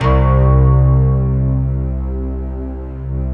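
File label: bright guitar strings, Slow Bright Guitar Strings; Optical Media International - Sonic Images Library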